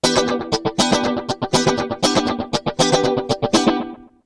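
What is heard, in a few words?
rhythm guitar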